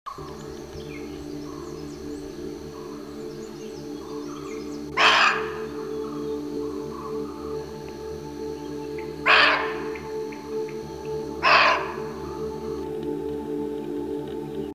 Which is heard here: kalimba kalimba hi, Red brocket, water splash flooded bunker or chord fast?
Red brocket